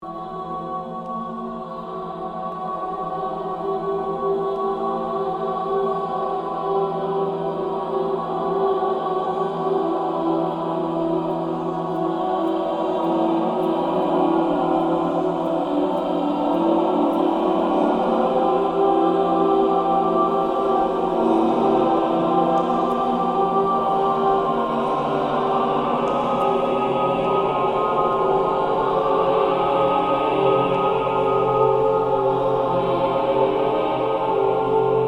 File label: PERI for choir